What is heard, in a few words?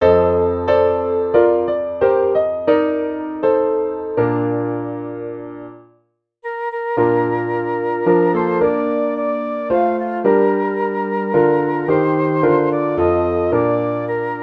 flute piano; purcell henry; come away